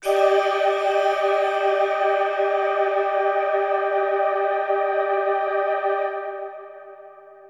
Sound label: synth pad ambient 160 C; Sphere